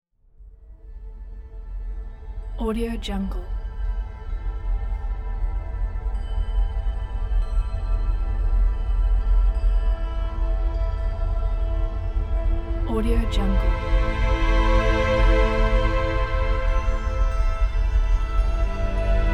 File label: emotional background